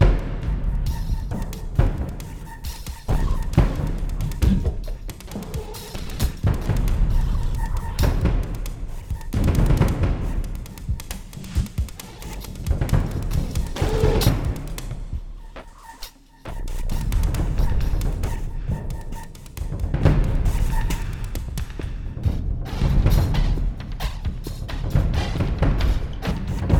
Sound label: Drums Middle Fast; Crysis